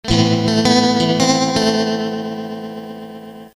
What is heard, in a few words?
clean